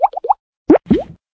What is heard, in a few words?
Potion; Xiaomi Redmi-Note-8-Pro